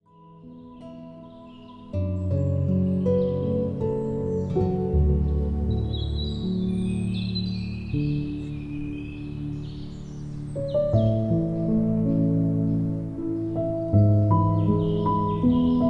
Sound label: Relaxing Music Peaceful Piano Music Guitar Music